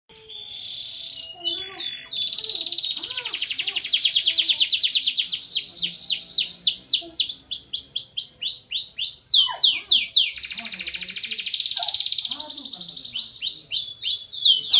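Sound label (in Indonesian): kicauan kenari, canary; kompilasi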